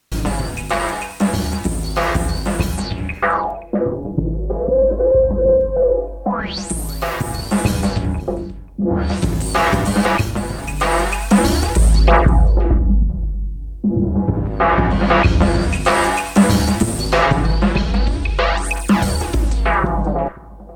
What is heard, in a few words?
filtering; Creamware minimax